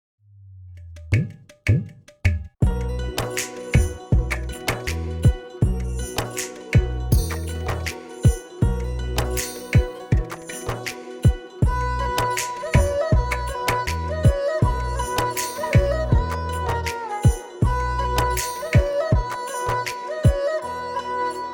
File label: Haryanvi Song